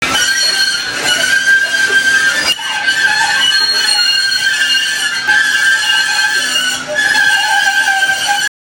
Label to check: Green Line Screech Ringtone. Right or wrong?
Right